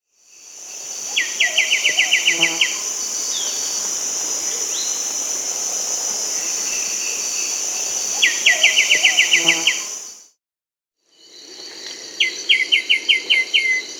White-winged Becard; Pachyramphus po; S. America

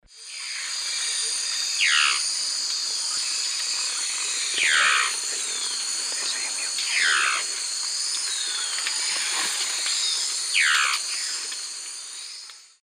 Gymnopithys lunulatus, call; S. America; Eastern Lowlands

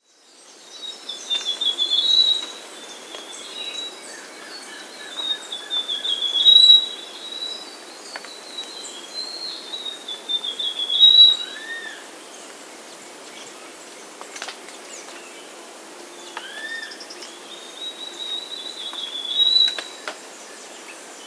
Russet-Crowned Warbler (Basileuterus; S. America

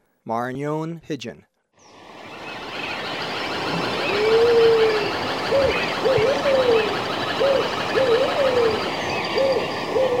Maranon Pigeon; S. America

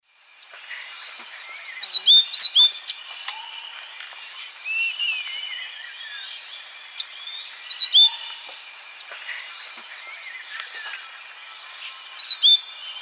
Euphonia xanthogaster, call; S. America; Eastern Lowlands

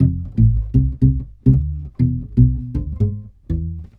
TOTAL SPANISH GUITAR

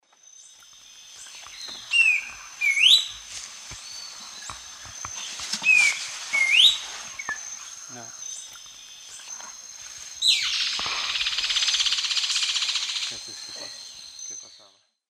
Schiffornis major, song and alarm call; S. America; Eastern Lowlands

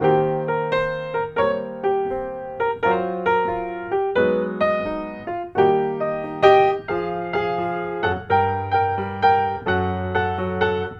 AMERICAN PIANO

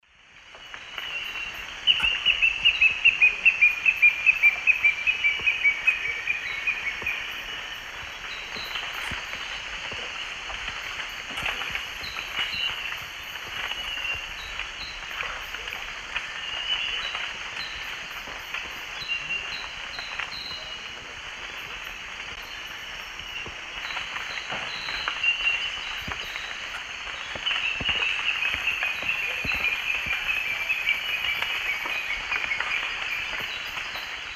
Xiphocolaptes promeropirhynchus, song; S. America; Eastern Lowlands

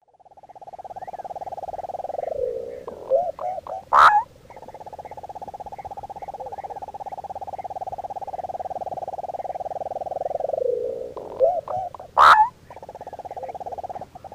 Chordeiles rupestris, song; S. America; Eastern Lowlands

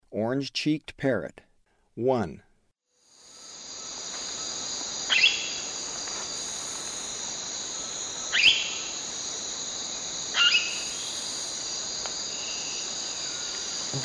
Orange-cheeked Parrot; S. America; Eastern Lowlands

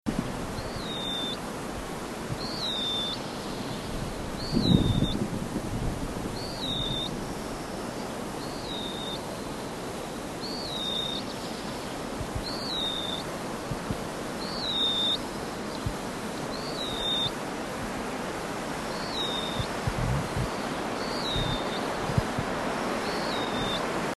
Band-winged Nighjar, Nature's Songs, S. America

